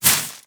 run grass stop

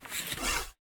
Attack Foley; portal quest; android; Antihero

